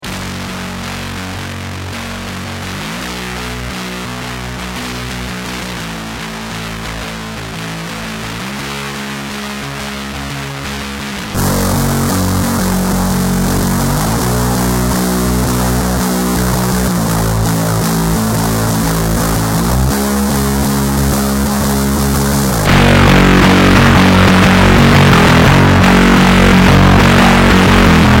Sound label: bass bend; deathbyaudio